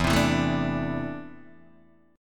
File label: E 7th Suspended 4th Sharp 5th Guitar Open D